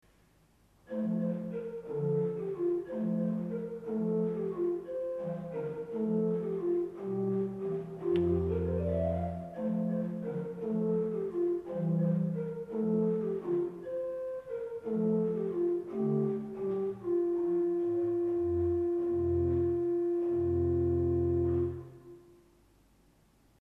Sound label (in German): Hohlfloet 8, Orgelstimmen